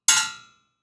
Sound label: percussion metal hit